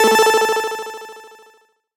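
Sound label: sounds for notifications on phone in 8-bit style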